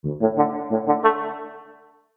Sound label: Oboe Call And Response